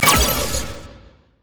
ping; LoL; vi

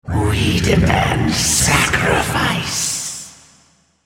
attack; Malzahar

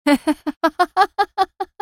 laugh; Soraka; en US

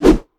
basic attack knee; rengar

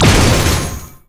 death ray; LoL; viktor skin03

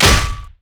basicattack human; LoL; shyvana

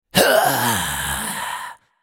dying; Lich

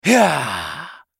spellcast effort; BlindMonk

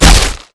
basicattack; LoL; vayne

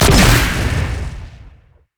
grenade; rumble skin03

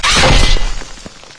yasuo base basic attack; LoL

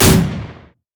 teleport; LoL; diana

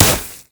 basicattack; LoL; brand zombie

